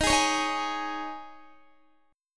Eb 7th Suspended 4th, Guitar; Eb7sus4; strummed

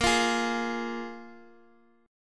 F Minor Double Flat 5th Bb

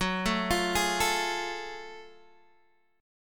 Gb Minor Major 9th Gb, down, Guitar, Open D

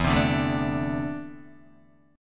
E 7th Suspended 4th Sharp 5th, Guitar, DADGAD

